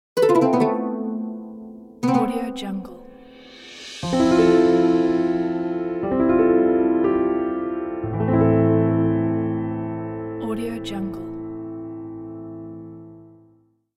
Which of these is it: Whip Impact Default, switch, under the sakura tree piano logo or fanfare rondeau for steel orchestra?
under the sakura tree piano logo